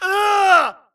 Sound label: grunt death crush; Titanfall 2